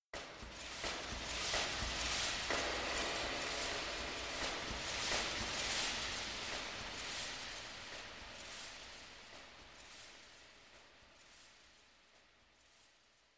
smash echos

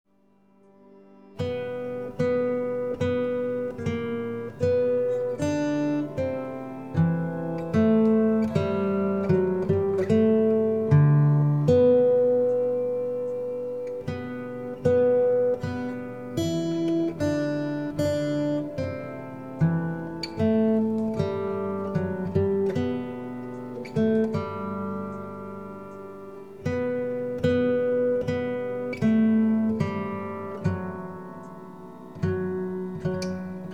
O Little Town

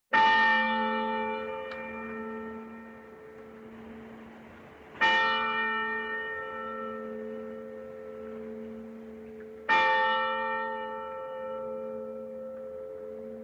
churchbell

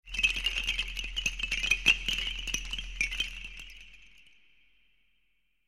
water pouring wooden chimes

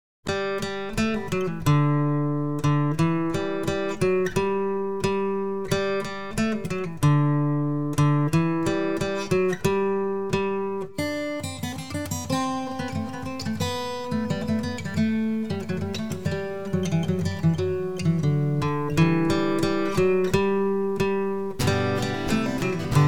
Ding Gong

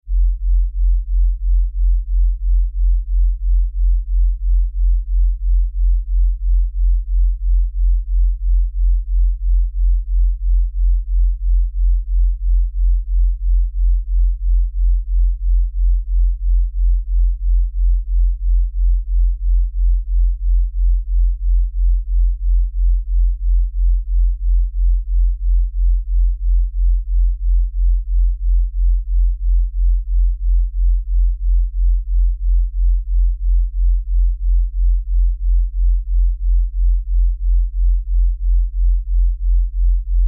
42-45 Hz pulse

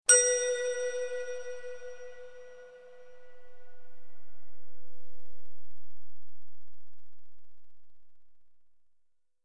flute and chime

